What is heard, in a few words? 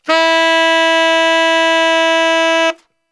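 E 2X SAX STR, saxophone; Best Service; Brass Super Section; SAX STRGHT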